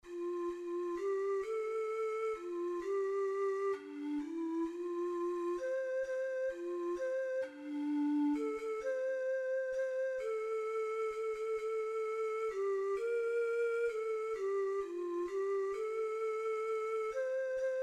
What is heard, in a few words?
the friendly beasts, ocarina four hole